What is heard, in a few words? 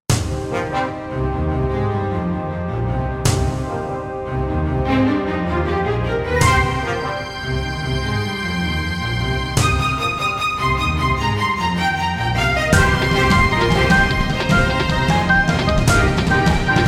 Drum Emotion